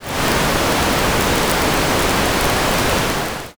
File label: fire